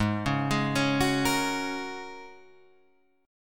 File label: G sharp 9th Suspended 4th, Guitar, Half Step Down; chord